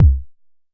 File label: Jomox MBase, drum machine; Jomox MBase 01